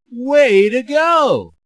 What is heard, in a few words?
hurray